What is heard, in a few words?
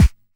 BD; Best Service; Dance Mega Drums